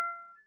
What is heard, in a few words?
button hover; UI & Menu; freepixel